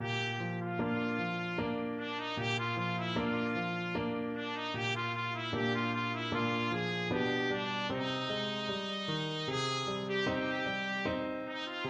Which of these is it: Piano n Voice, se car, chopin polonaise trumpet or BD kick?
chopin polonaise trumpet